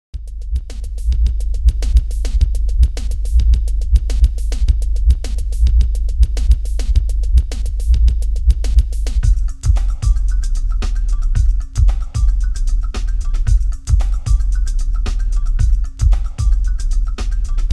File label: bass beat